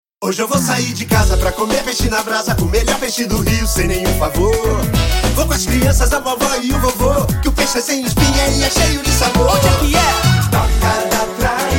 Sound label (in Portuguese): jingle